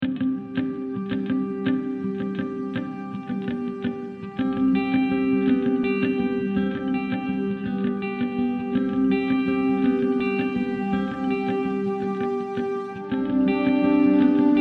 Eagle Eye more sad

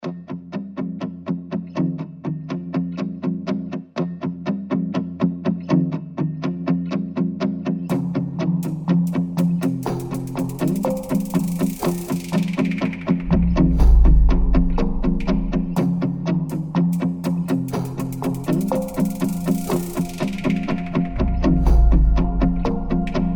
Hard Steps

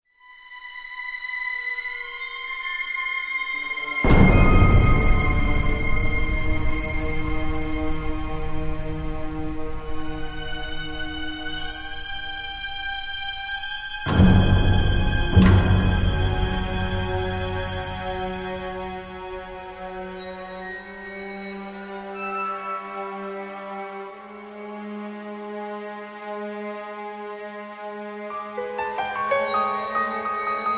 Scary Murderer

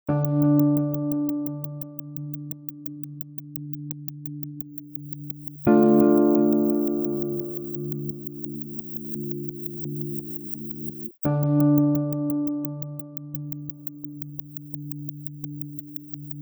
Ticking Questions

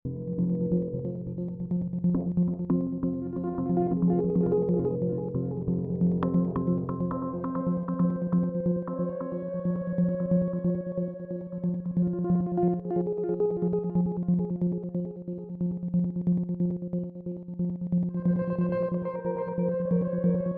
Tense Bed Clicky

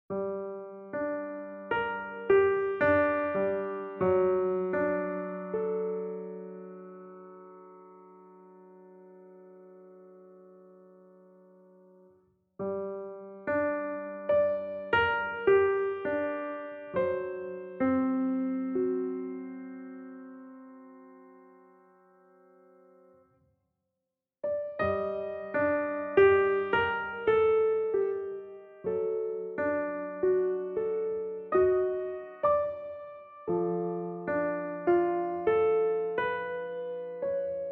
A Silent Tribute solo piano

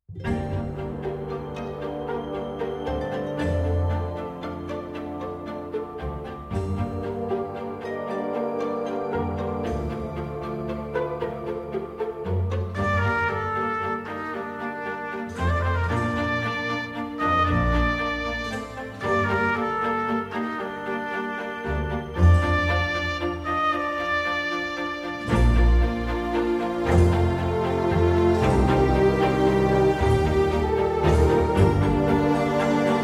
solo trumpet melody

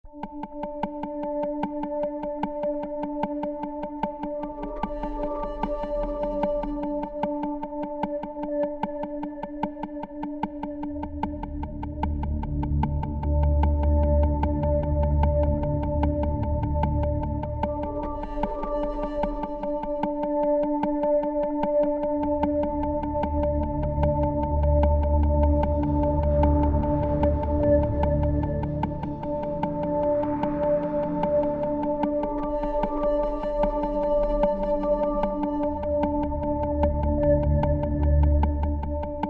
Atmosphere

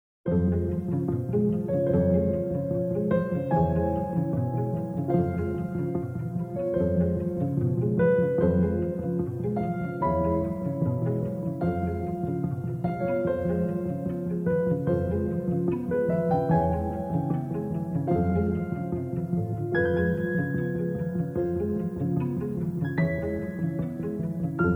Short Pizzicato Cellissimo

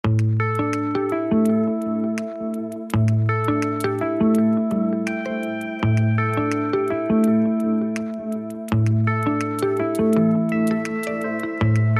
Harmonicland

